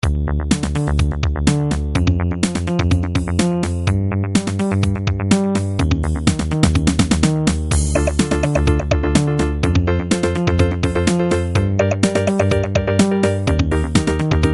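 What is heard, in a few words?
action, stock game music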